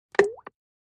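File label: Splash Small Plop Plastic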